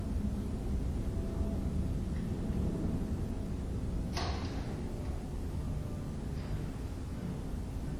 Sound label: a door clicked open or closed